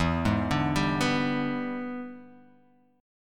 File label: E 7th Sharp 9th E down Guitar Half Step Down